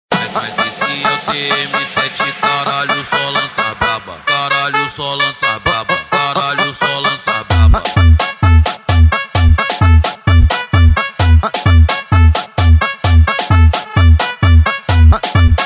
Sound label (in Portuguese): sequencia do trompete envolvente; funk; equipe os vigarista 013